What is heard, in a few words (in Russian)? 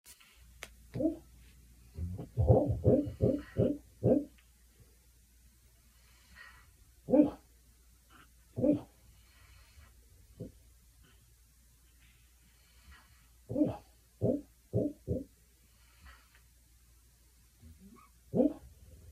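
один из наших песиков спит в кресле и иногда во сне; sazhaet koshku ili chto to v etom rode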